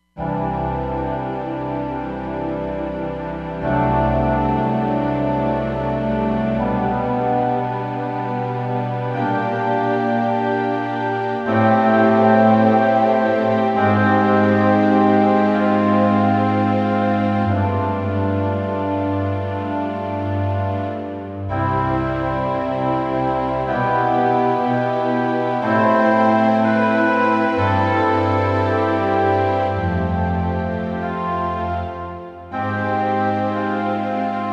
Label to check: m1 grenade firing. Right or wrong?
Wrong